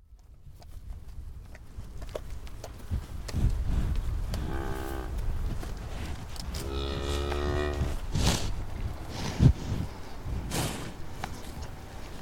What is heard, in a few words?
Soundscape; The soundpiece was devised as an integral part of the installation in the caravan.